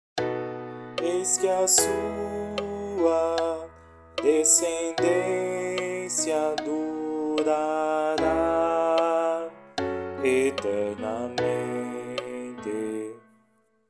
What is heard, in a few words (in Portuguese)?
Sao Jose Coro